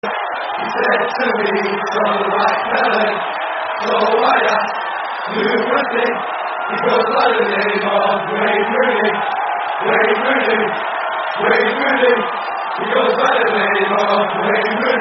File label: With Crowd; White Pele Rooney; Man United; terrace chants